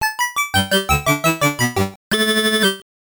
Miss; mister viking; system 1; arcade

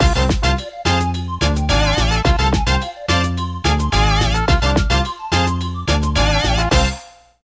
Race Win; lego racers

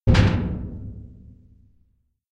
bang; Five Nights at Treasure Island; windows

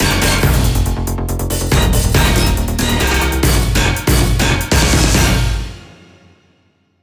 Jingle Team Lose

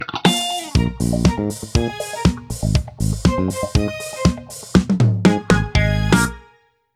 Frog Dance; super mario maker 2

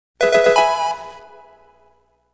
Fanfare - Item Obtained

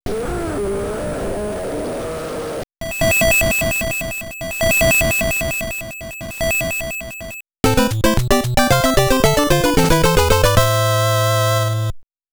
Block Clear; Castlevania III: Dracula's Curse

